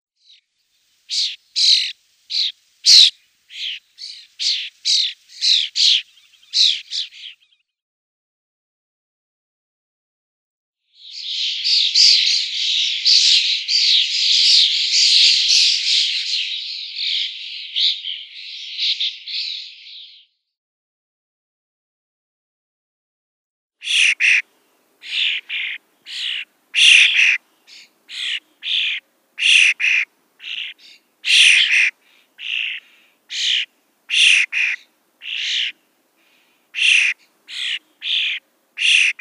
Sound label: European Starling nestlings